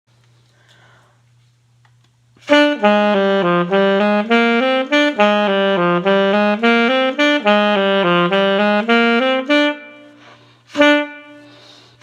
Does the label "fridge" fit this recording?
No